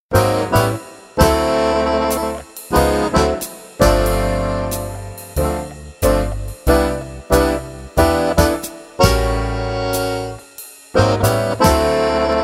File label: jazz time